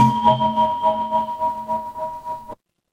instrument singing bowl

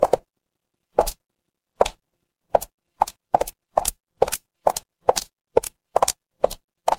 footsteps heels

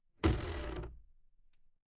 door creak

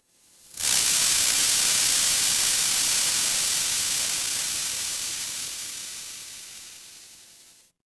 fire electric spark

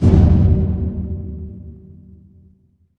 transition braam

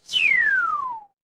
cartoon slide whistle

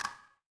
ui click

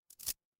glass crack

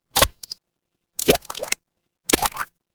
water splash

